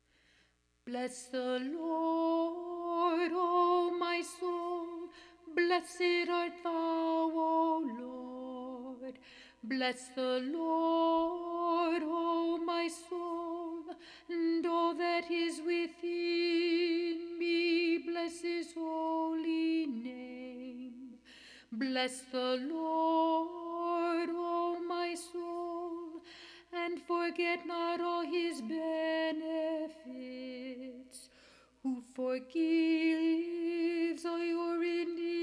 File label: first antiphon alto